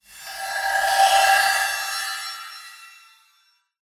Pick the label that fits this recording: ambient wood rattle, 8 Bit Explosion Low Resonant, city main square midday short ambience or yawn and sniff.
ambient wood rattle